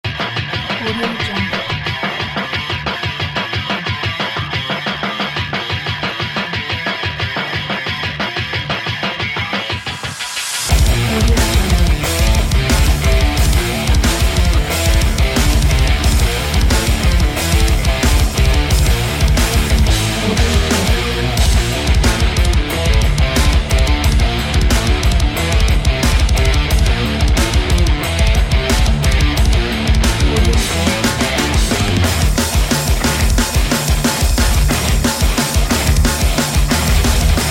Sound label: agressive rock metal